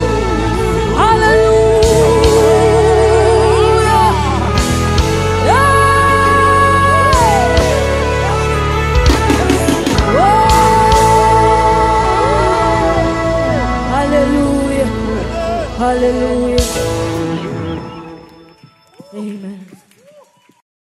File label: Gospel Choir